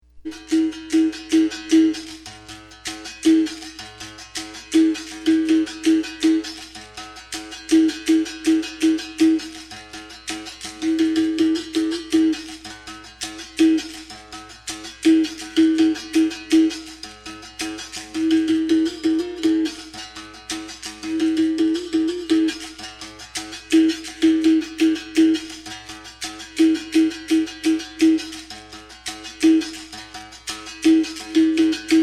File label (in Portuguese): toque iuna, capoeira; toques